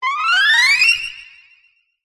squeaky metal Designed Vintage; PMSFX

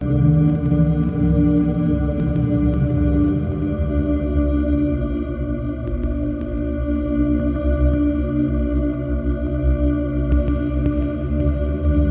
space drone; Audio Mangler

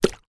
baby lotion put down on surface

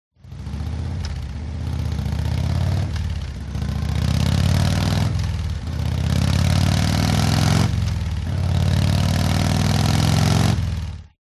MOTORCYCLE Harley Accelerate Gear Shift; TRANSPORTATION

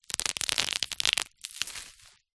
office bubble wrap pops

